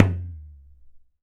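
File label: KHOL HEAD, DRUMS OF IND; AKAI S6000 CD-ROM; WorldSounds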